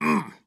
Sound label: Attack Medium; Batman